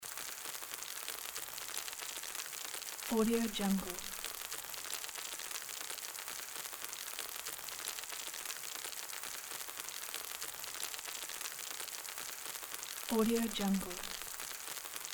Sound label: food simmering loop